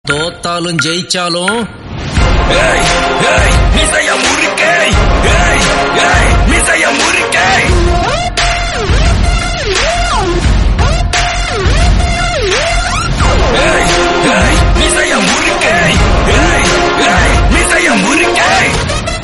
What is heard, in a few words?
hip hop